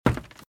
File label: Footsteps - Wood - Creaky - Run - Right; RadwinIsland